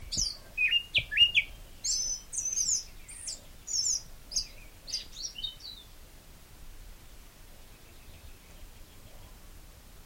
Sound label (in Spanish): Turdus serranus Mirlo Serrano